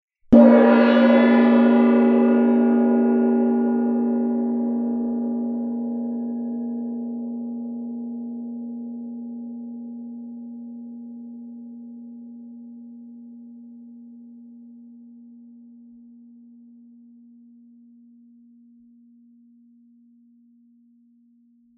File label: himalayan, vol